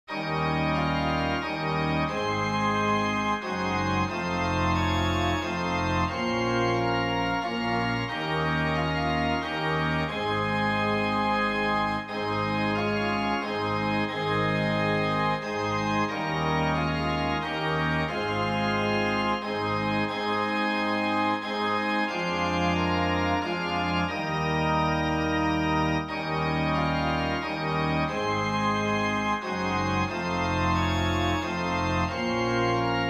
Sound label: organ; I Am Trusting Thee, Lord Jesus